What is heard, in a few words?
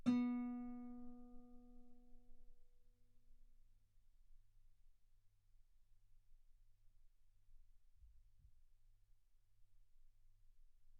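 Guitar ff B string B3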